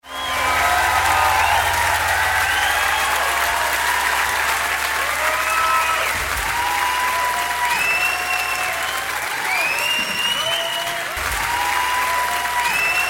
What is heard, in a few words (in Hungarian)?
Ovacio